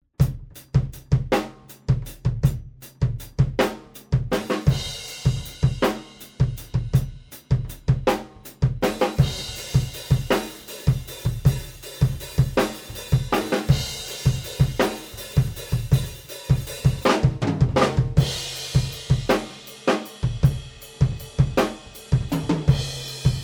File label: Room Mics RNR1